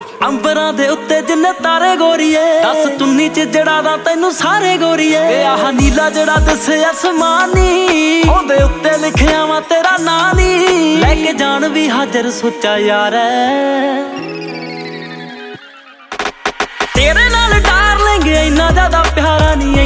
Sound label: Punjabi